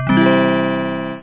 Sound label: Phrase Intro; Wacky Wheel!